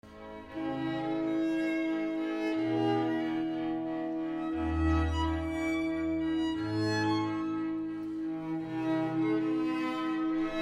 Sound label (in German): Sinfonie Nr 2 II Larghetto; LvB